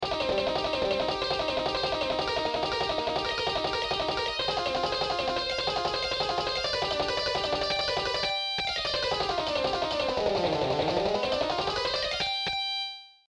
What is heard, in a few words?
air guitar; skwisgaar